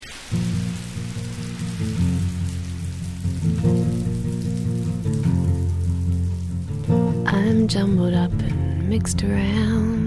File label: cry